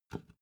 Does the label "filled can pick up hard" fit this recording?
Yes